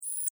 Insect Voice Scream, Insect, Voice, Scream, Vocalization, Bug; Animals:Insects; Blastwave